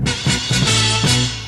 excitement

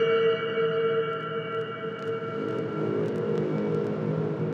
bullet; Rumbles

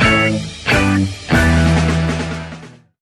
rolls fade, stabs

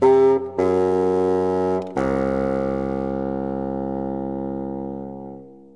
fail horn

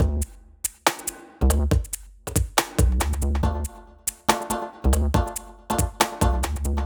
raga rage, Electronic Loops

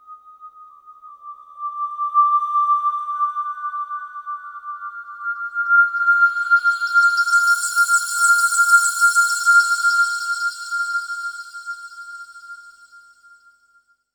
flute, Loops, Sad

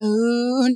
"ooh" SUNG

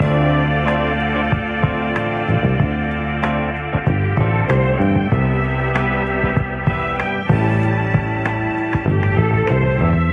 strings easy going, Loops; Phonky

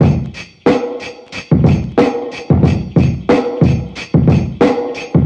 hollow kicks, boombap drum loop; Drum loops

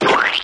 squeeze splat

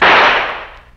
gun shot; Crash Bang Boom

